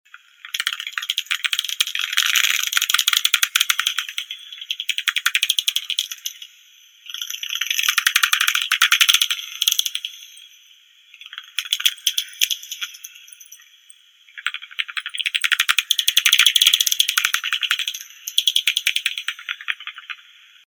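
barbastelle bat, Barbastella barbastellus, echolocation; shirokoushka evropeiskaya; zvuki prirodi; eholokatsiya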